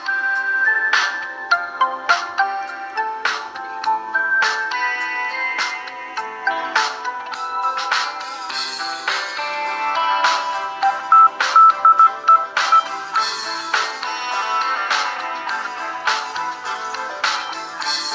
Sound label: Vivo Original Ringtone